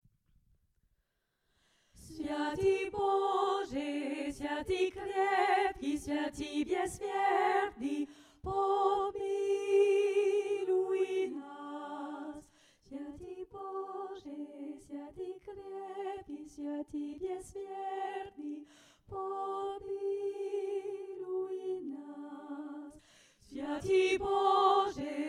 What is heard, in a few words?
altos; Svyiatyi Boje